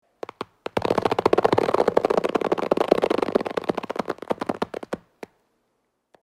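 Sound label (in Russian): ras, zvuk rastiagivaniia, stretching sound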